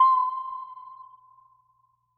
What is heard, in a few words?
rain blip